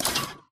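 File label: Tick Movement Servo Walk; Titanfall 2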